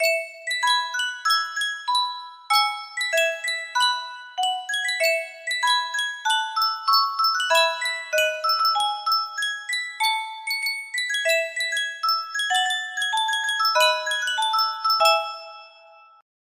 Yunsheng music box, music box melody

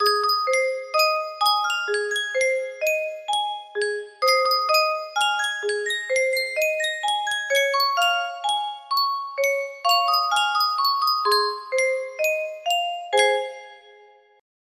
Sankyo music box, music box melody

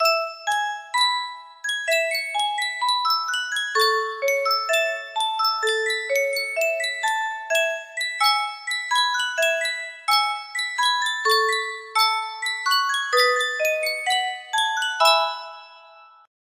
music box, music box melody

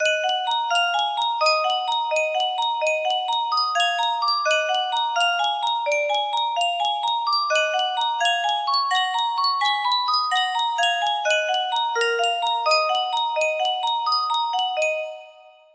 music box

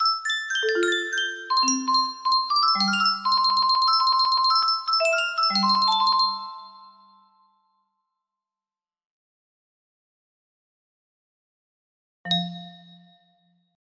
melody, music box

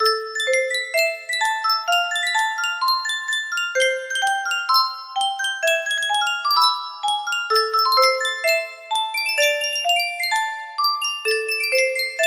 yunsheng custom tune music box

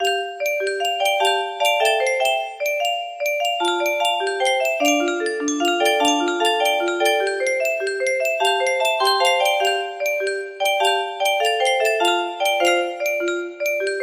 melody, music box

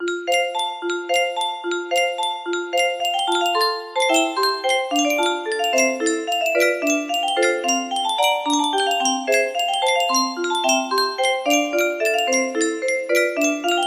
melody, music box

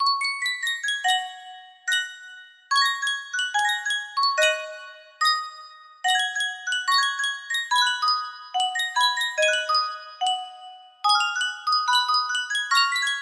Sankyo Music Box, music box melody

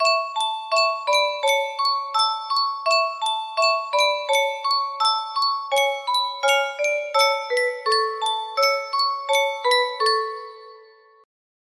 music box melody

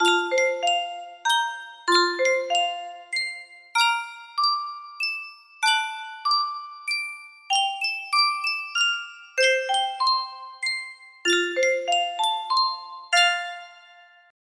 yunsheng music box, melody

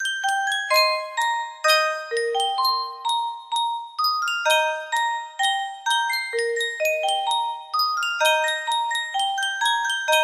sankyo music box, melody

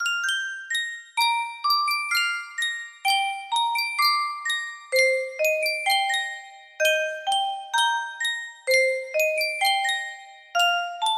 sankyo custom tune music box; clone

